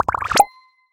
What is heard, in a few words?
ui good evaluation